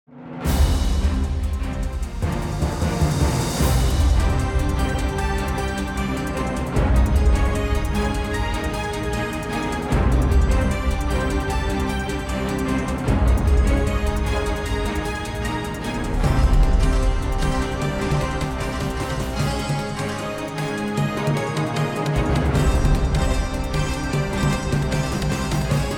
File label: BGM STAGE; Monster Crasher; Android